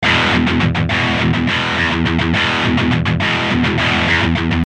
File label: guitar comp